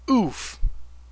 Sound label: pain; twitch-1.0